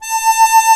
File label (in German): MUNDHARMO VIB; Alpine Volksmusik